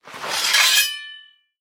sablyu dostali iz chehla

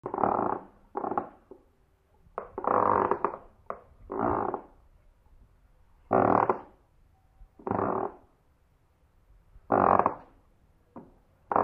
skrip polovic

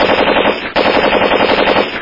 napryazhennij boj s privlecheniem pulemeta

